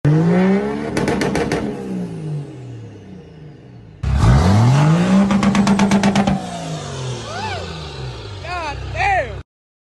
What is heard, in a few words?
hard cut limiter, dodge ram; RaceME